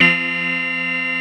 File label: 5TH LEAD C3, Analog; USB Soundscan vol.49; Analog Fantasies; AKAI; PROPH